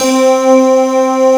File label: WSYNTH PD; CMP - Analogon